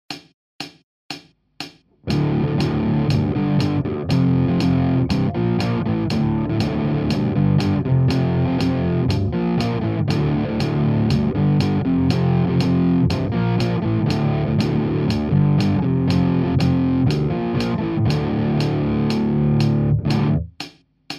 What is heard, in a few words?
dreadmaster overdrive alt rock